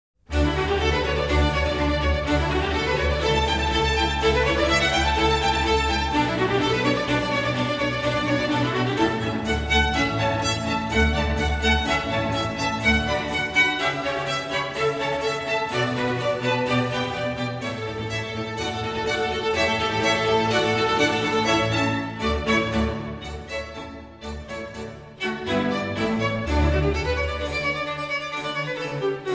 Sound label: Allegro